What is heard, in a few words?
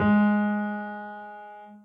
soft hitwhistle